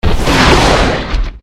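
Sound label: Dragon buff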